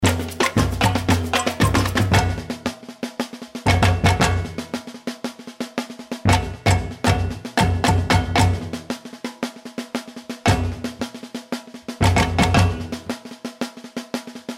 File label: Thumb Break, samba, Batucada